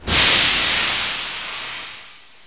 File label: steamburst